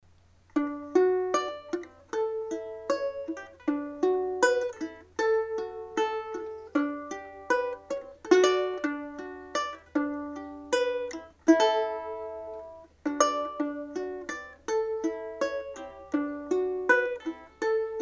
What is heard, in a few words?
ukelele, antique tenor resonator ukulele; guitar